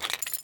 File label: knife open; tf2